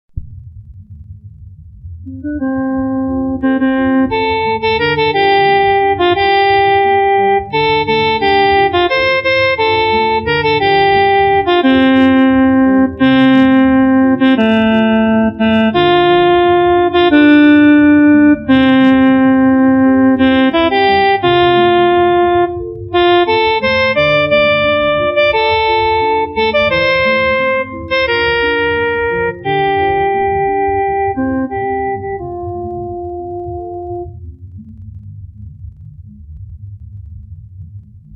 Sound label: Saxophone; Abiko City Song